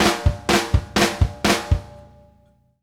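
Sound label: snare + kick, drum fills; Sampleheads - New York City Drumworks VOL-1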